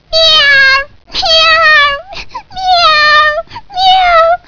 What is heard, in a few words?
CAT, 猫; 特酷声音素材库